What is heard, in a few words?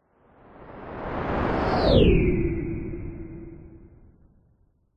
science fiction spacecraft medium sized electric fly by whistle hum fast; zapsplat